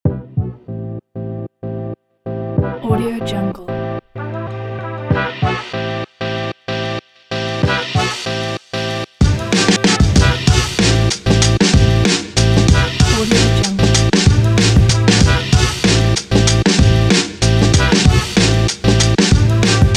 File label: summer